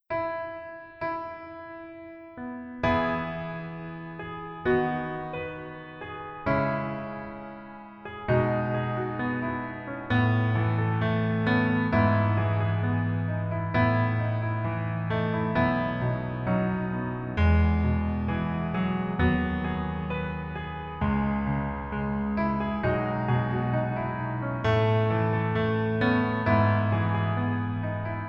Piano ver, 멜로디MR, -1키

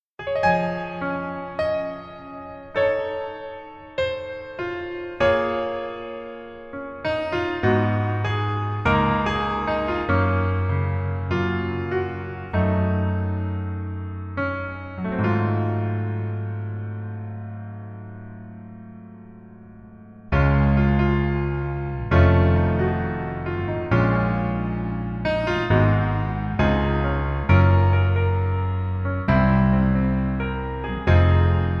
Piano ver 멜로디 MR

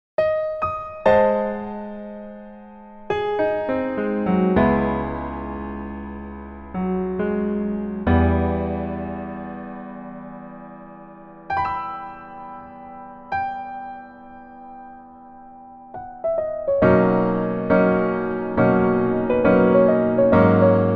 Piano ver, 멜로디MR, -1키

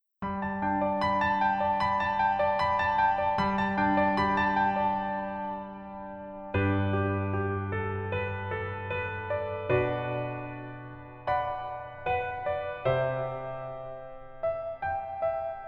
Piano ver 멜로디 MR 여자 키; 꼰대 인턴

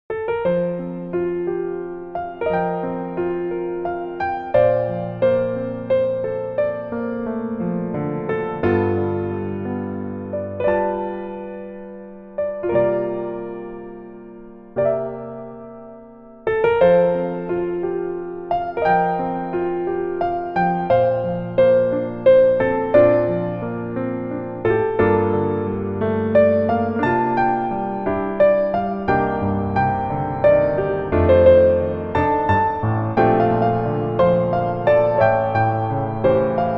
Piano ver, 멜로디MR, -1키

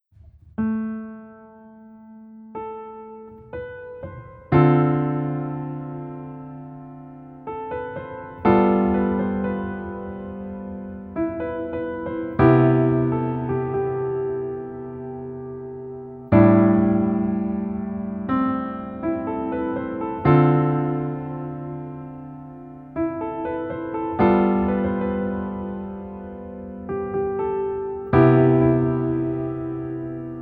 Piano ver, 멜로디MR, 여자키

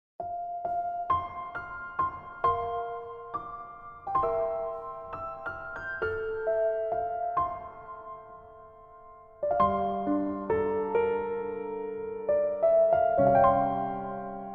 Piano ver -1키

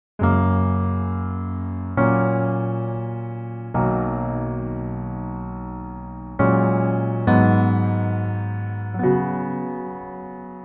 Piano ver 남자키

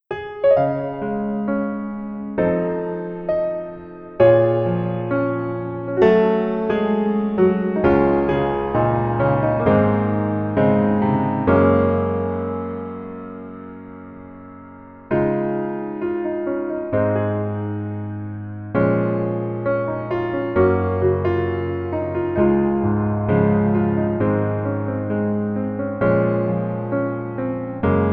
Piano ver 멜로디MR 남자키

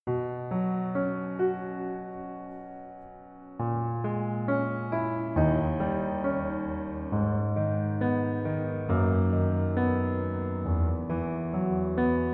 Piano ver -1키

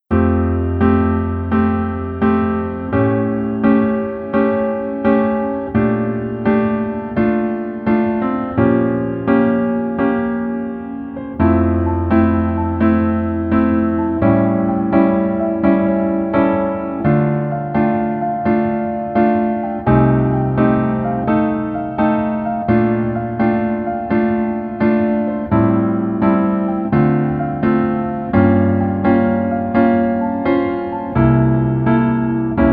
Piano ver 멜로디 MR

